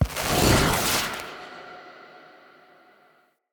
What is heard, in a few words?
Fire Rain Cast; Powers; Fenn